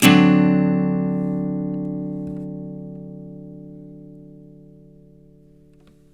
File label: CHORDS, Blues Guitar; ILIO; Fretworks